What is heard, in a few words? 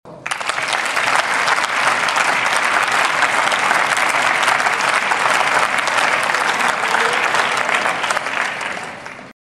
applause